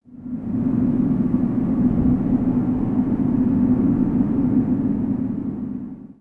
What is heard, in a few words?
Wind Cave One Shot; ambience; Lego; ninjago